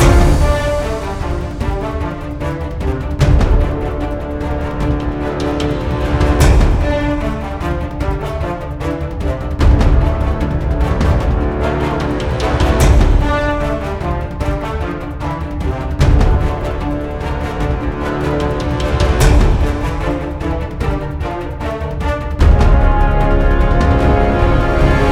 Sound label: Thrill Loop